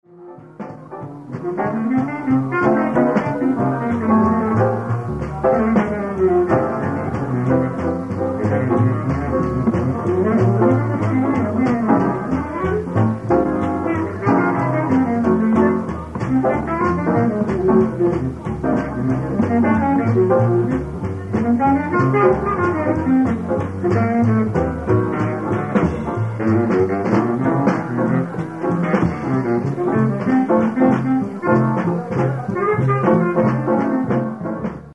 blues

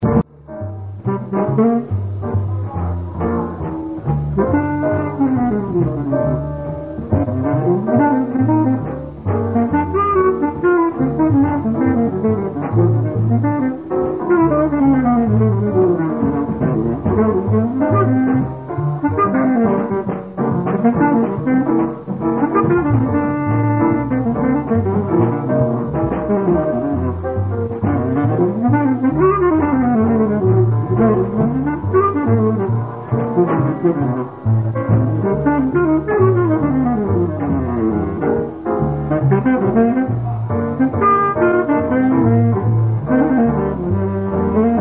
Minor Blues 60

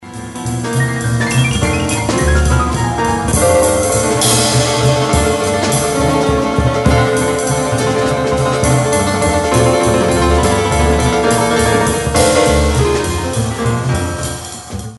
Trio 3 4 Blues

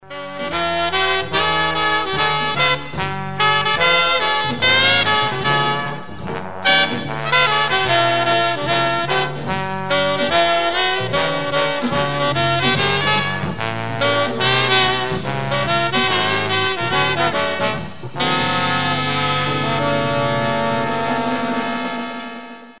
Swing Work Song 20

